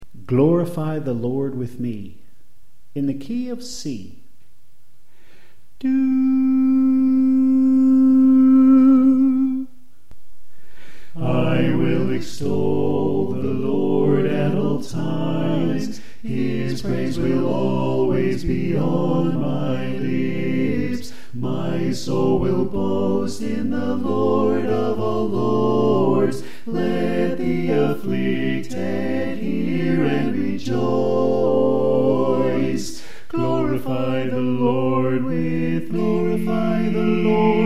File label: Alto Left